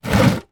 leisure draughts checkers outdoors large plastic piece move slide